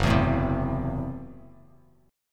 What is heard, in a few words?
Gb Minor 7th Flat 5th, Bass Standard; strummed; JGuitar